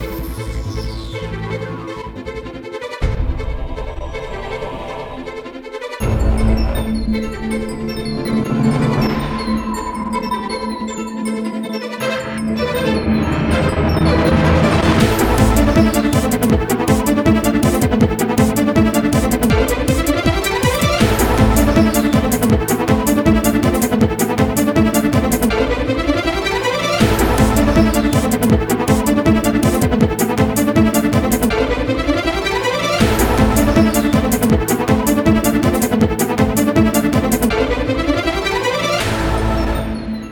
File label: BGM Violons Dangers; The Next Penelope